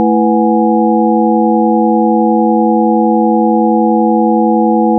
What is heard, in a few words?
ear noises; animal